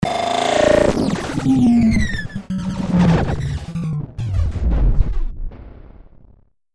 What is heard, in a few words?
Power Off